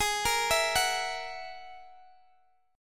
G sharp 7th Suspended 2nd Sharp 5th, Guitar, Open D; x,x,18,16,19,16; down; chord shape